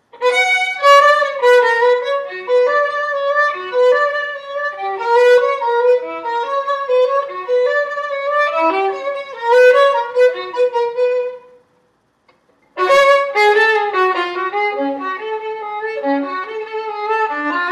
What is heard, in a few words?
strings violin bach